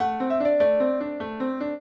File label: piano; Mozart